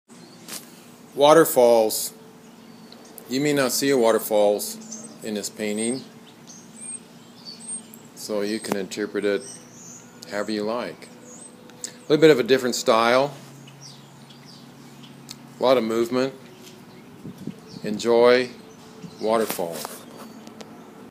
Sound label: Waterfalls